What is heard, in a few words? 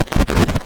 Digital Rustle Glitch